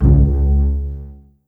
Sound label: C#1 PIZ F BAS, string; East West; The Ultimate String Collection